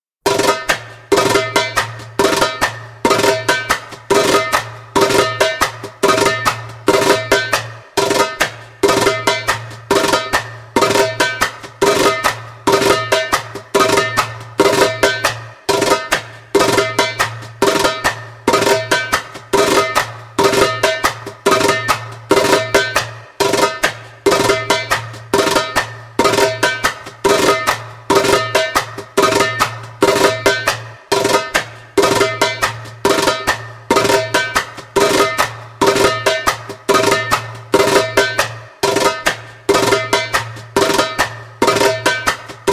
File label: Drill in Nine (fast) spoken, Djembolale; Djembolale - Fills and Drills